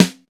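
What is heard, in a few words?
snare drum neutral; SNAREDRUMS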